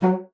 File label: bassoon F#3; Xsample VOL-6; C BASSOON